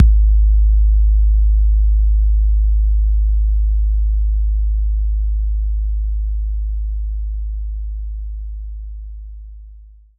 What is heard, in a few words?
TR 808 Kick; knights try